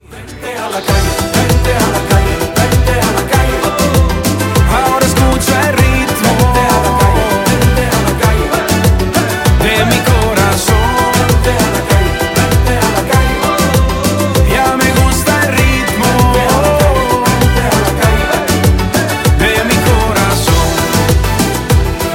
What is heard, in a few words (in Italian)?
batte il ritmo